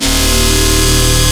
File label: groovebox bass